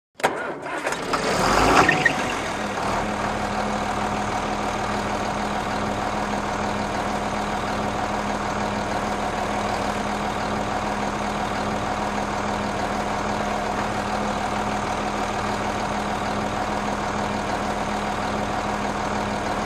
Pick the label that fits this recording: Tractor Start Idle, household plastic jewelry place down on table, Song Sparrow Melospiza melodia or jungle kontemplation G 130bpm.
Tractor Start Idle